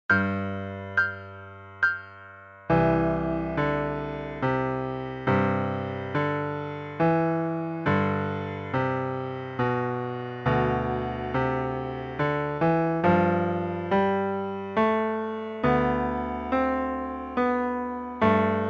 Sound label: Monkey Mirror Duet Slo